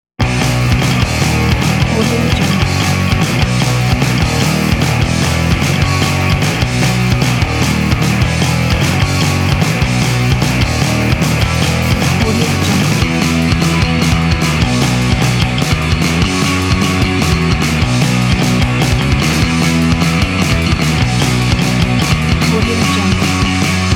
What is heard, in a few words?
catchy punk rock